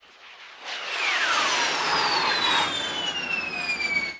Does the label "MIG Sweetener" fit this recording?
Yes